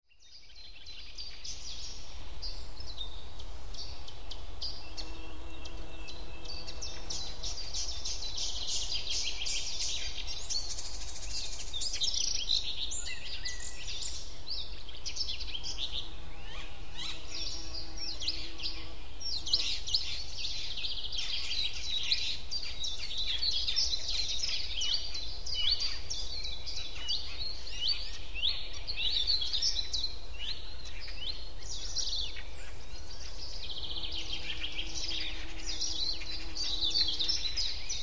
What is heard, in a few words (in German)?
teich wiese